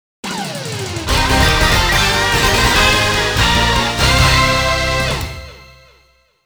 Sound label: MISSION CLEAR (CLEAR BGM); skull fang avengers in galactic storm